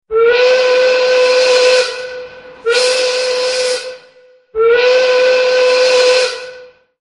Whistle Alarm

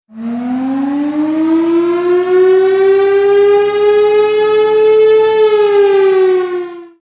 Nuclear Explosion Alarm Siren

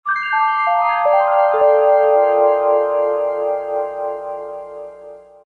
vibes fluttering to earth; fantasy

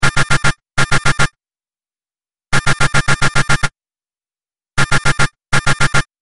glitch ringer

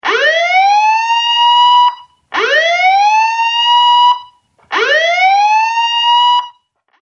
Nuclear Systems Alarm

